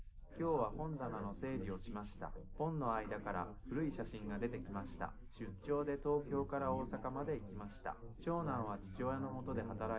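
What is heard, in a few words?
male, live recording 250ms 5cm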